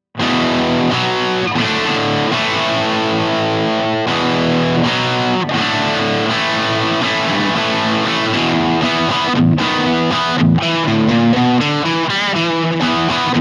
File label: rock chords; jensentone